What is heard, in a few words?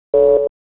warning; TeamAlligator